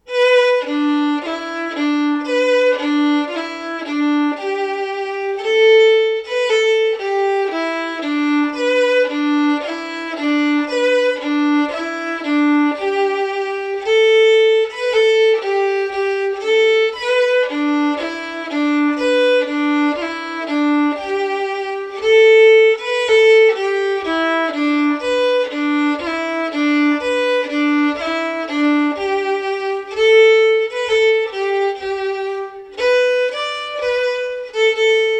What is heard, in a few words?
polka kerry slow